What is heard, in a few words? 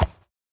soft, horse; minecraft